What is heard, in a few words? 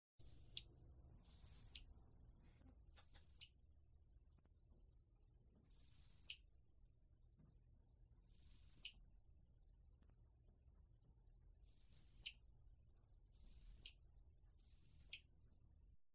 SQUIRREL MONKEY SHORT VERY HIGH PITCHED SINGLE CRIES; wildlife sounds